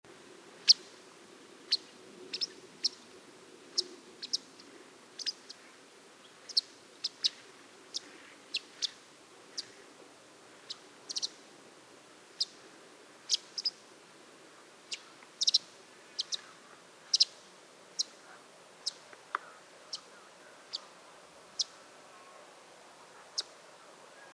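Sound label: white wagtail alba, flight call; seelhaus see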